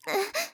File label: Moan; my cute commissar 2020 gamerip